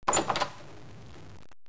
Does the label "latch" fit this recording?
Yes